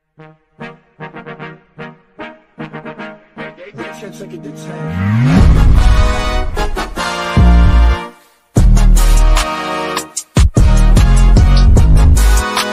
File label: instrumental ringtone attitude ringtone trending bgm ringtone